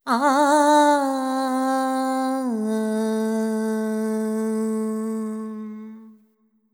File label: ETHNO FEMALE, Vocals; ethno2